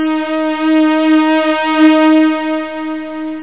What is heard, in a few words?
string